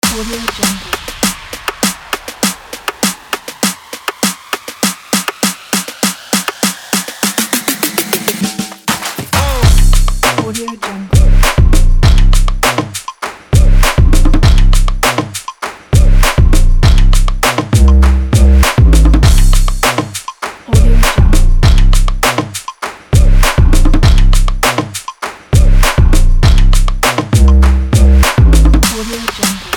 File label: the rap